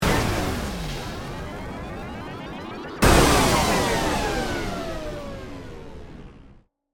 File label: Stinger Hit Sweep, Production, Element, Stinger, Hit, Sweeper, Hit, Descend; Stinger Sound Effects; BlastWave